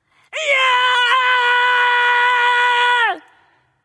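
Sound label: battlecry, female; smite; voicepack; hera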